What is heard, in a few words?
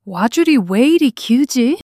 surprise